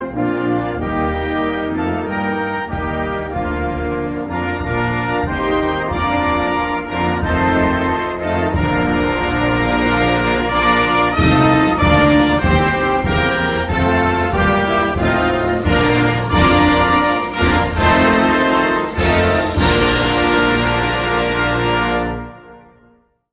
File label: australia national anthem